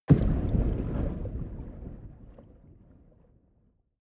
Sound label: underwater